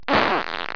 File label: zap, debris; svencoop; cracklife